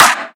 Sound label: trap steel snare drum sample a sharp key, snare one shot tuned to the A# note